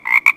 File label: ribbit; gab-social